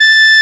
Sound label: flute, Akai S-1000, A3; ProSamples vol.55 - Retro Sampler